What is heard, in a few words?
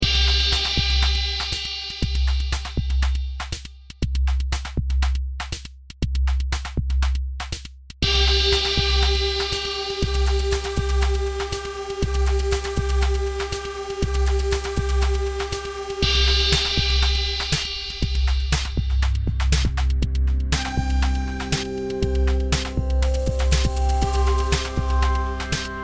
Electro Jam